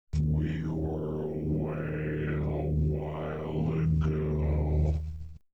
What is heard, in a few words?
Voice Plane Morphing